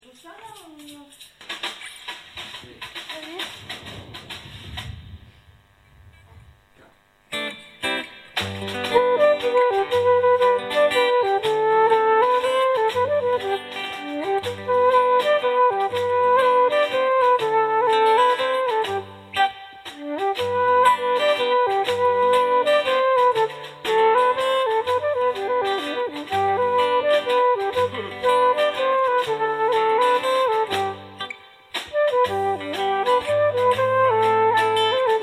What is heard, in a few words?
mazurka